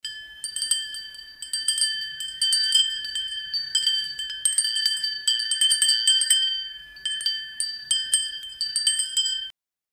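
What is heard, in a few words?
Japanese wind chimes tinkling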